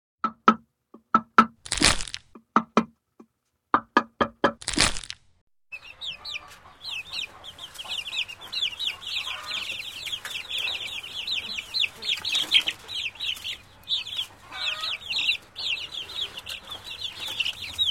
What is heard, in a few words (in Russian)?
vylupljajuschiesja i pischaschie cypljata